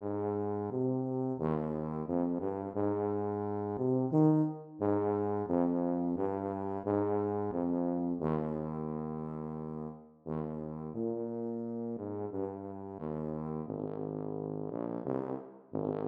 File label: tuba